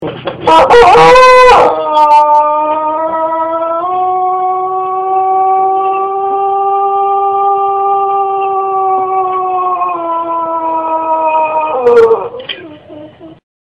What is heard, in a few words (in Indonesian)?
ayam pelung garut suara mantap; si quro